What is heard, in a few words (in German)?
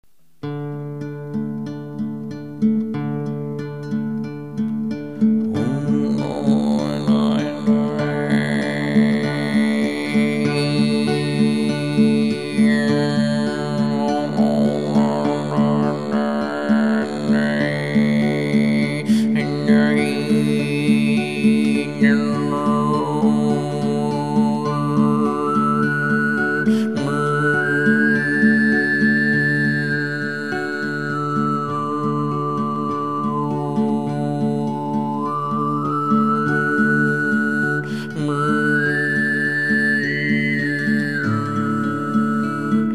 Gitarre Gesang; Gitarre mit OT-Gesang (Improvisation); Gitarre mit Obertongesang (Improvisation); Lieder für verstorbene Erwachsene